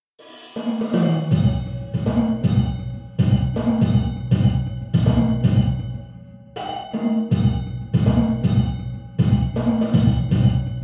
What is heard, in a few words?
trash room metal grate hybrid drums only; tascam